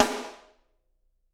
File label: SD, Drums; Bob Clearmountain Drums I